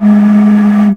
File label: JPNS, BAMBOO FLUTE; Voice Crystal - Steve Reid Definitive Percussion